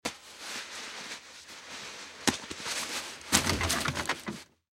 Bag Load Grain Sack, Bag Load Grain Sack On Wagon; Drops; Foley; Objects; Blastwave

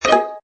Musical, Button sound; Multimedia

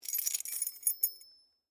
Key Chain Move; Foley; Jangling; Objects; BlastWave

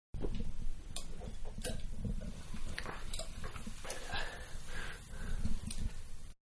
Champagne Drink; Bars; Manhattan Production Music

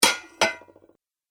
Heavy Pasta Bowls Hits And Wobbles; Dishware Sound Effects; Household

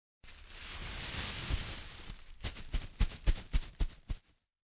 Plstc Bg, Crinkly and rustling of a plastic bag.; Crinkling (Sound Effects:Objects)

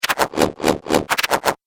Machine Device Broken, science fiction

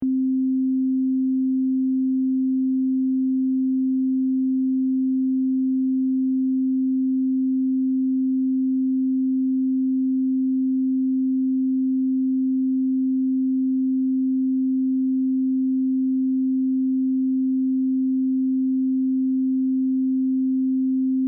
Test Tone Middle C Reference 261Hz; Technology; Tones; Sound Design

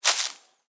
Footstep: Individual Footstep On Dry Leaves; Footsteps; Human; Stuart Duffield

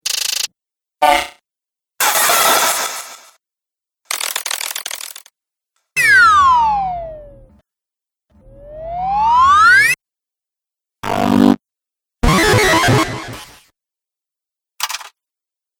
Future Robot Sounds; Robot Sound Effects

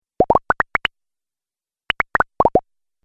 Idea Bubble Pops, cartoon; Cartoon Sound Design

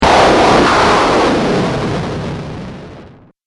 Game App Explosion, Video Game Sound Effects

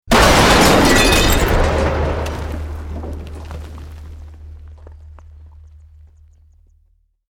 Building Collapse Medium

